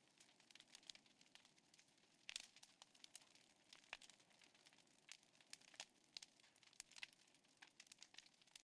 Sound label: Burning Coal